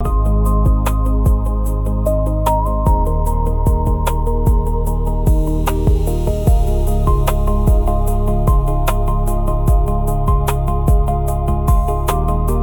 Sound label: loop; bgm